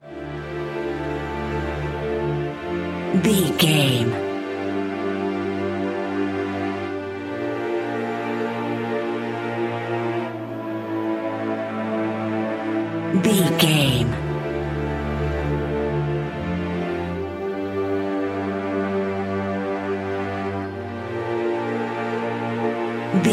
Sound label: tropical luxury underscore